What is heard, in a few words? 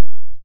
Switch